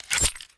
glaive equip

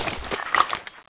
clip out; tfa cso; monkey wp set1

